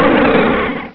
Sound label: cry